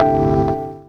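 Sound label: chord, Stabs; E Lab; XTc Files of House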